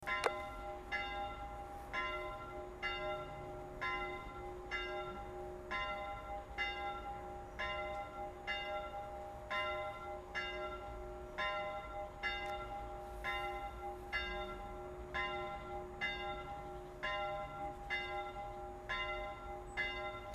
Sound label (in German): kreuzkirche friedhof glocke